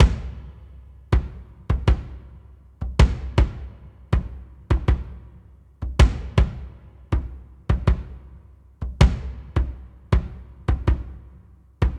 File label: Kick; Loop Set - Guitar Hypnosis - Dream Pop Guitar